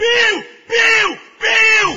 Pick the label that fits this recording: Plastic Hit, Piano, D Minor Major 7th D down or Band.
Piano